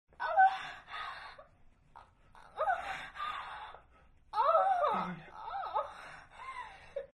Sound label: sex, moans; The Witcher Hunt